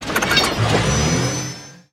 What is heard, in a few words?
Spark Drill Attack